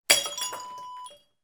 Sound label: jar drop